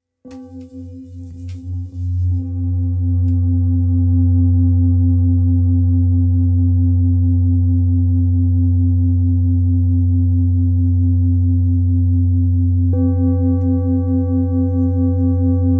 Bol bengale fa

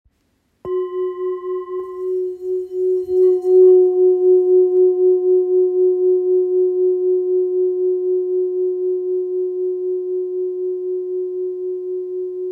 Bol fa diese 915g

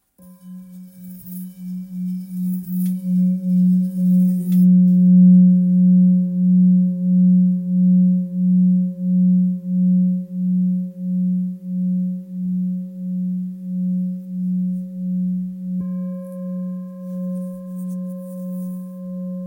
Bol thick fa diese

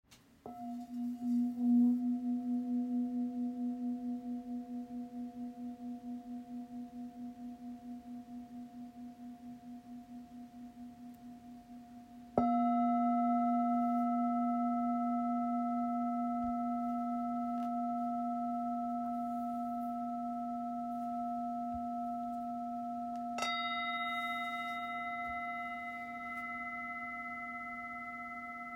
Bol real FM Capricorne si

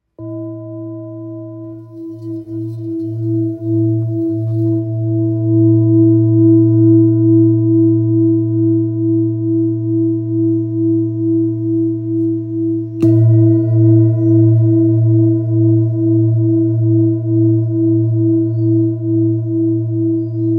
Bol bengale la

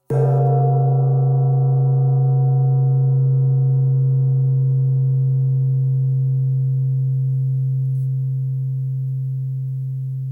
Chau gong 40cm do

